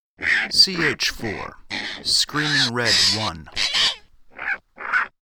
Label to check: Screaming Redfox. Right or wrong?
Right